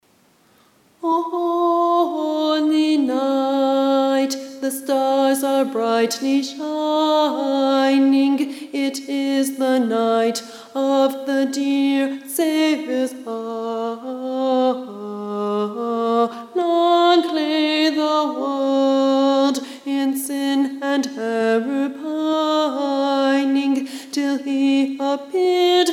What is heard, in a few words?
Alto